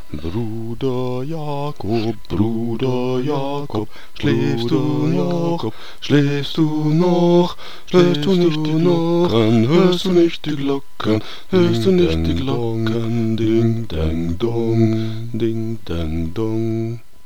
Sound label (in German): bruder jakob 2 stimmen